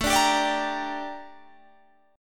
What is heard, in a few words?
G Minor A, chord; Gm/A strummed